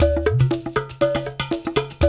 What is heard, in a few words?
120 UDU, African Contemporary; Spectrasonics; Supreme Beats